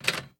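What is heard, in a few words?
Sticky Keys modifier; accessibility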